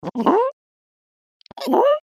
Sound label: Two Hapalemur boings; The Audible Phylogeny of Lemurs